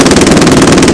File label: shoot, mg81z; 22khz; emplacement